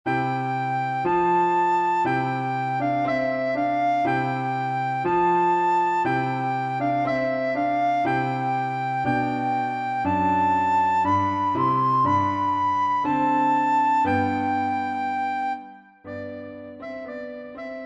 O du Froehliche Blockfloete und Klavier

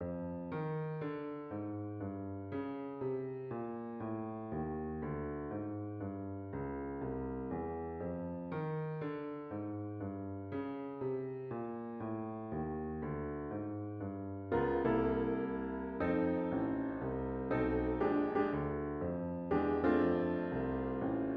Klavier Solo; Leise rieselt der Schnee

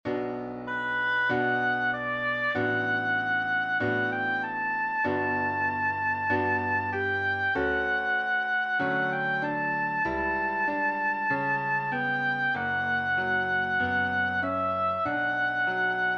Alles wird gut Oboe

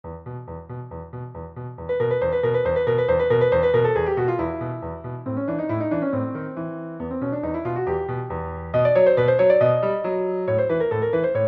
Etuede III

